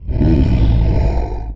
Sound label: SIGH; kabuto; giants